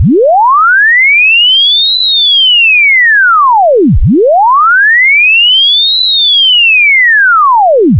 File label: tone 8Khz alias